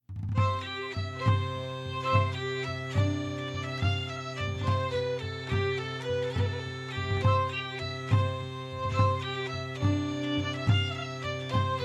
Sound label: Tavern; Assassin's Creed Unity